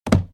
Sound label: Wood Hit; Fishing-Travel